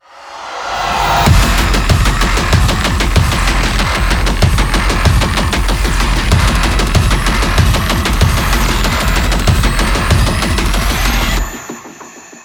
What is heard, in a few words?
Main Heavy; Doom Hunter Base - Main Heavy 10